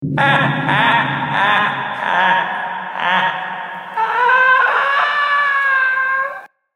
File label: Laugh; Joker